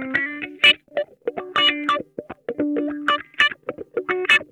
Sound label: MAD CAT, Funk Guitar; Zero G - Funk Guitar